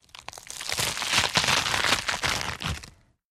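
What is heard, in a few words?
sounds of lettuce leaves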